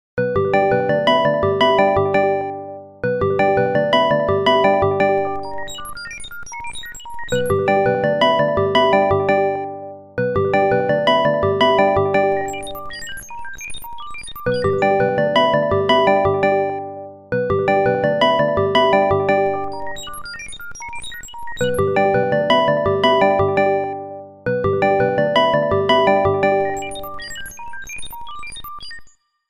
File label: iphone 15 personal